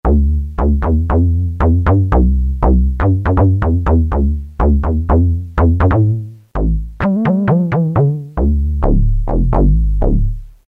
Comic Bs, Roland M-DC1

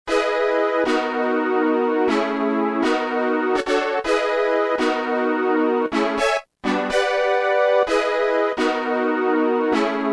major rave, Roland M-DC1

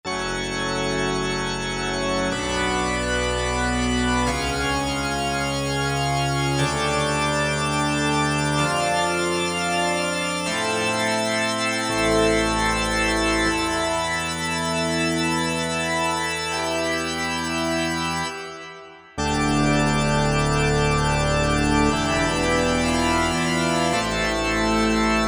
Pipe Organ, Korg MSC-9S Organ

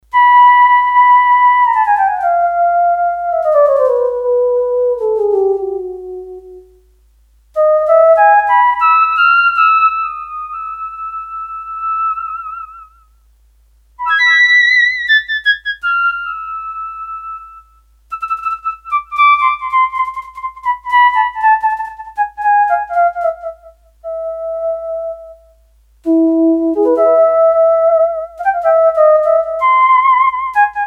Flute, Korg DS-8; Factory Patches

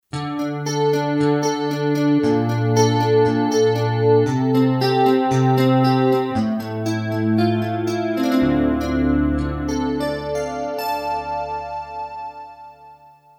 Hyper Guitar, Kurzweil K2500X; Factory Programs

